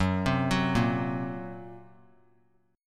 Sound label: Gb Major Flat 5th, down; arpeggiated; 3C,3F,3A,3D; JGuitar